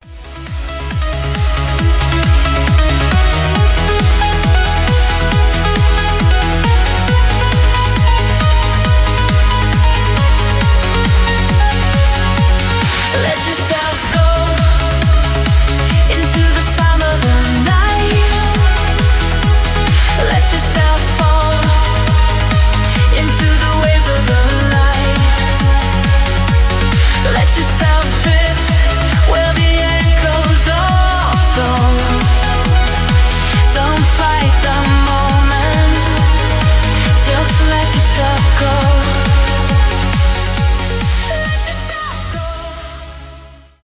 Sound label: trance music